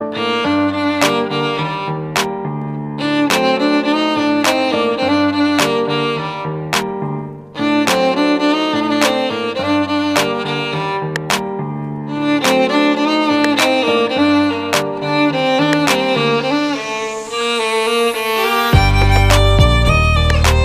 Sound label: violin ringtone; ton de apel